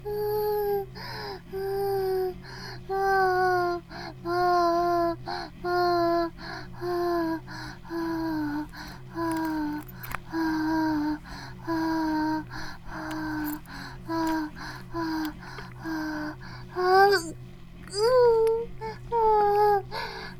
バイブ放置; イクたびにわけて

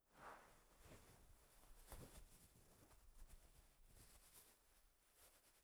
布ズレ, ASMR

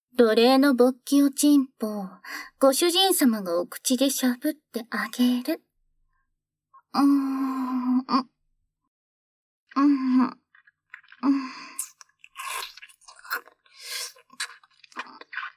フェラ

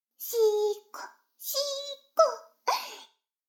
シコシコ音声, ASMR; 逆時間停止されて

